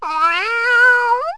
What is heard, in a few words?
long meow; animals; Wired for Sound Pro CD; Aristosoft, Inc.